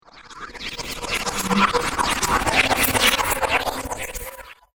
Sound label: The Wind Spirit, wind; Wind Sound Effects; fantasy sound effect; role playing games, magic videogames